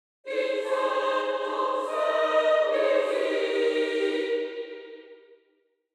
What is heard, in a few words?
Angelic choir angels; eternal tranquility